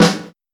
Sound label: old school warm sounding acoustic snare sound e key